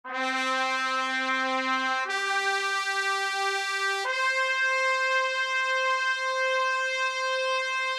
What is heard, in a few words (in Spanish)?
altura